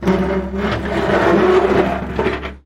Metal Stress - Pulling, Scraping Metal, Single

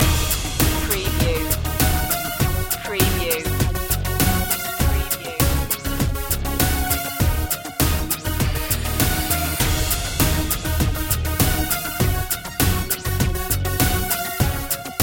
deep impacter loop

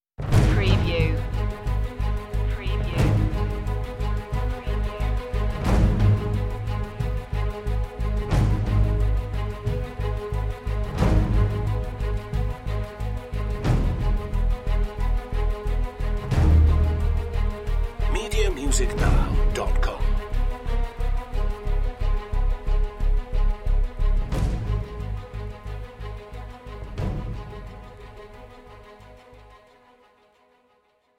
staccatos and perc only

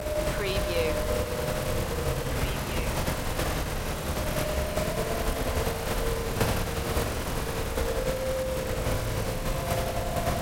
interface glitch ambience loop

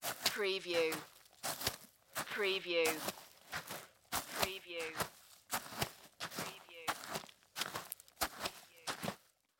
Footstep Dirt Walk

